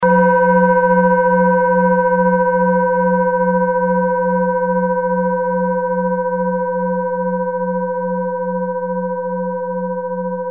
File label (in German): assam planetentonschale